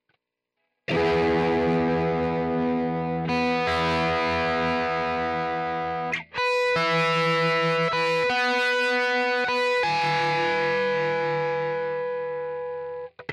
no boost

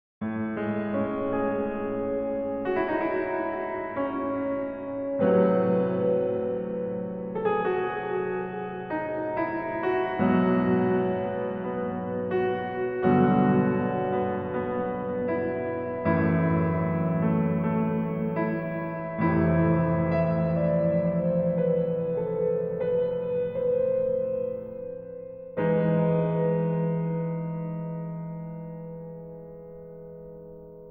piano rev max

